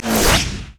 charged shot; LoL; graves

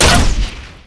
tumble; LoL; vayne

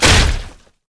basicattack dragon; LoL; shyvana

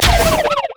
dash; Vi

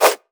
crit attack; Zed

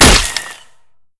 basic attacks; Zyra

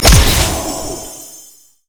pick a card blue card; LoL; twistedfate

